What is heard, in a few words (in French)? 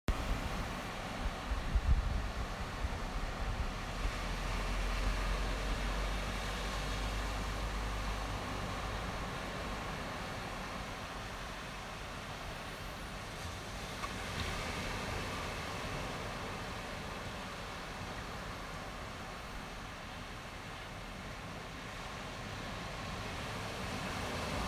plage; plousganou